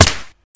tmp, weapons

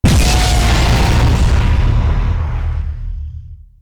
explosion

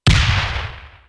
small explosion, light bomb; explosions

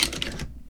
m3 insert shell, weapons

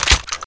m249 box in; weapons

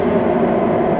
photon torpedo engine

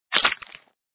knife deploy; weapons

